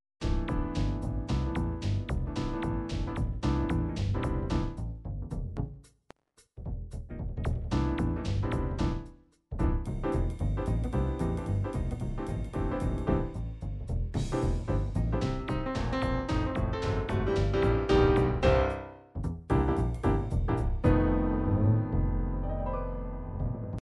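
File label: jazz uptempo jazz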